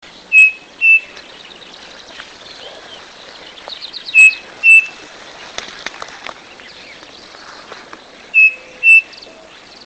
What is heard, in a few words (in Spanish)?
crespín, cantos, aves